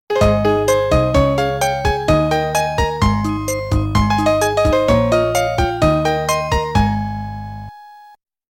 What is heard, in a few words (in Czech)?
melodie